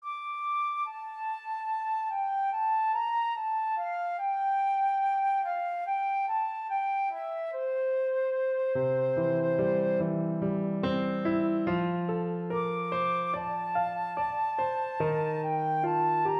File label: Flute Piano; Reverie